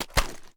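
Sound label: submachine gun reload empty end; gmod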